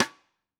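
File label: Pearl Piccolo Side Snare On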